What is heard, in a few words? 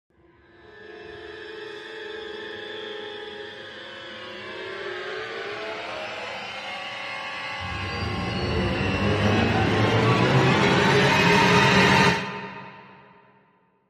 Dramatic Sting Strings